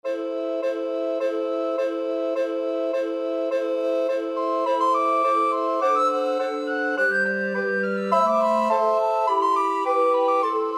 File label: romanian folkdance, recorder ensemble; bartok